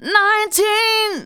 vox, Techno Trance; Ueberschall

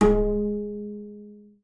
BING G#3, BASS FINGER; Xsample VOL-5

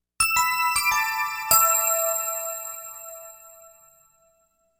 Bell; Sonic Foundry (Sony Creative Software); Infinite Soul VOL-1; Bells

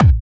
Kick; Zero-G; Nu Disco

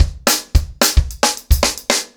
110BP, ACOUSTIC DRUMS; Sonokinetic - The Roots Drumloops

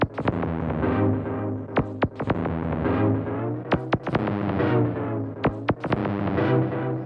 Crude Tape Loop, Tape delay; radiosonics

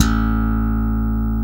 BS BRITE B, E. Bass, 5 str; Roland - Rhythm Section

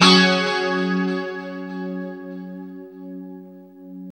2CHD F#4, Guitars; Spectrasonics; Hans Zimmer Guitars VOL-1; JC-SHEEN

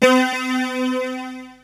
GLASS CELLO, siel; TECHNO-BWARE

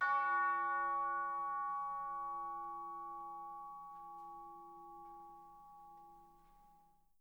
C#3, Percussion & Harp; Miroslav Vitous

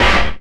Noise Hit; Drums & Drones

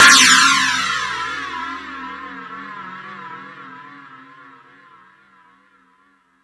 Space Drums, E-MU Pro-Cussion; drum machine

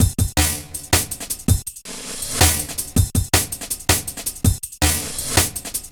Strteched Re-Group 162, Drum Loops, Jungle; Jungle Warfare 1&2

